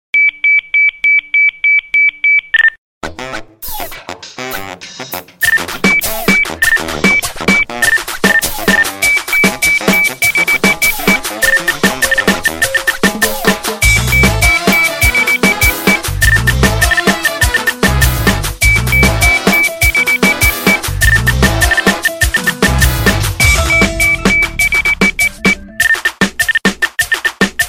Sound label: cumbia; Latin; Nextel